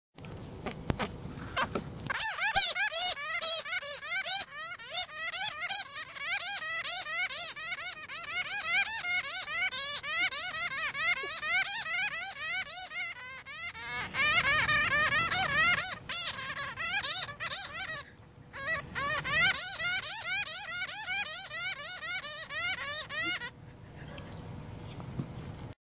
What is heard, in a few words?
bat